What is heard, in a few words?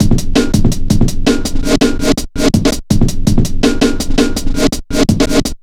165, Drum Loops, Drum & Bass; Total Drum&Bass